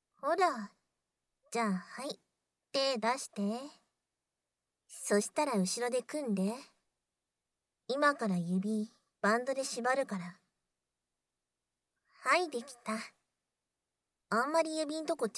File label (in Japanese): とろけるような耳舐め